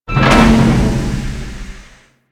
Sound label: Reactor Open End; Warframe; Ambience; Corpus Gas City; Gameplay Remaster